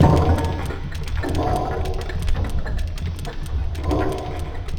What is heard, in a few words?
CLANK; ENVIRN-AMB